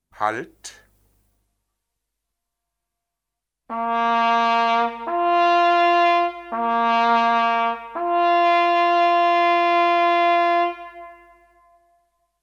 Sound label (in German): Halt, Jagdliche Praxis